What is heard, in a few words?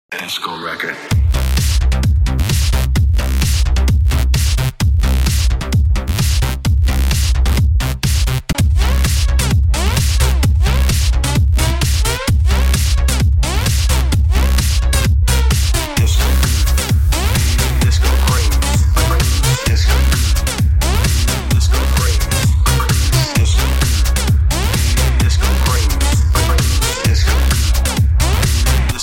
Disco; Disco Rocker